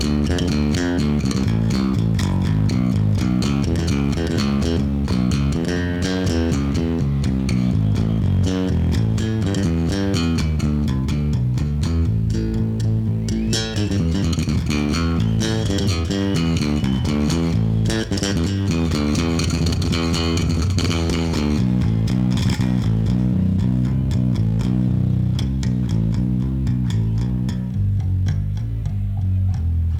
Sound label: Bass Solo